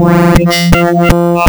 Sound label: PPG wavetables; waveedit256